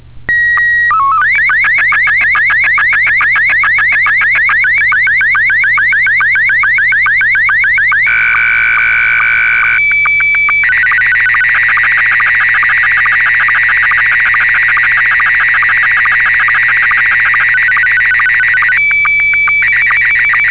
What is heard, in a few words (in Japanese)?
SSTV, S1; ﾏ-ﾁﾝｽｺｯﾁS1 約１１０秒